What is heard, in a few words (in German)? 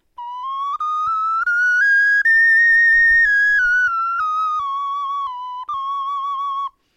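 tonleiter, Knochenflöten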